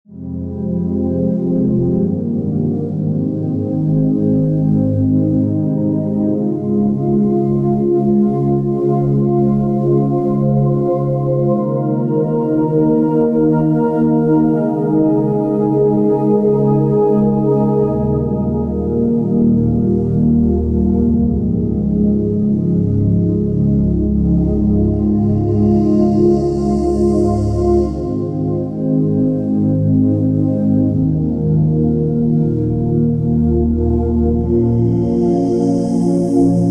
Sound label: Underscore PAD; Worship Flow